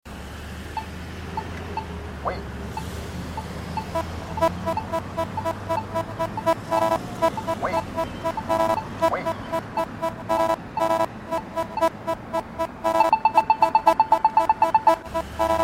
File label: Traffic Jam